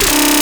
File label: bass